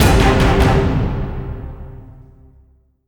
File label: Complete